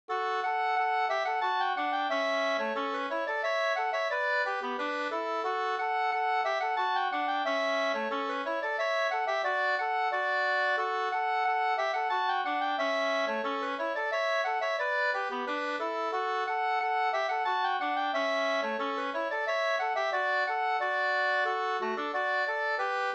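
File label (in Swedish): snoa, two-part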